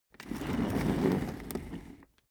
office chair trundle fast long

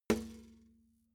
metal bucket hit bottom soft

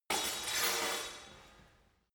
sword scrape

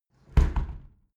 window close tilt heavy sequence

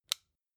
flashlight push in

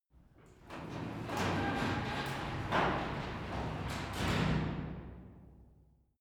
garage door close interior

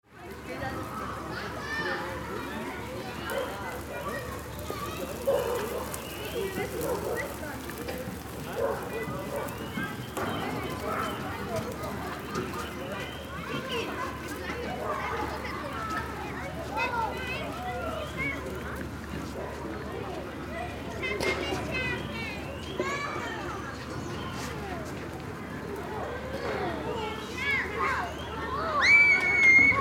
open air bath

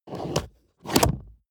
plastic draw lock

